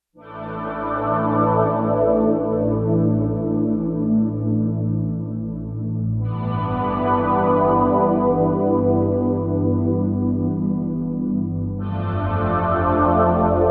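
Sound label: Slow Mellow Pad; Digital Synthesizer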